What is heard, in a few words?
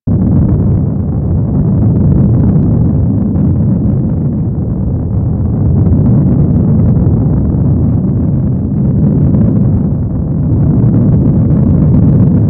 Orbiter Low Rumble; Ambience; Warframe; The War Within